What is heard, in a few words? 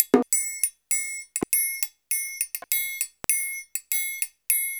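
TRIAN, triangle, Modern Percussion Loops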